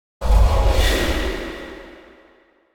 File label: Impact Metal Drop Distant Shock Hit Strong Metal Strike - Reverberant; Sharp; Hollywood Edge; sound designer's toolkit v2